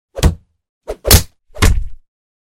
Block Punch Kick Combo; Hollywood Edge Martial Arts and Human Impacts

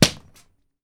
SLAP, FIGHT; 1000fx

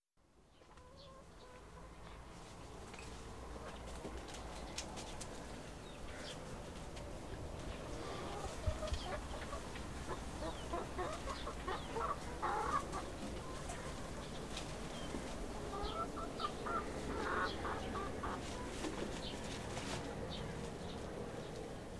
Afternoon On a Farm, Nature Sounds; Dr. Sound Effects; 210 Nature Sounds - 20 Hours of Relaxing Natural Ambiences for Meditation and Sleep

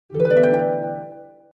Music effect - Arpeggiated synthesized harp; Hollywood Edge Sound Designers Tool Kit

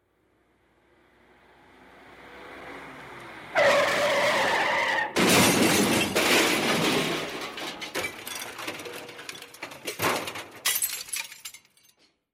Car Skid And Crash; SOUND EFFECTS1

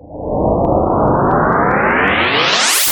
whoosh; Whooshes and sweeps

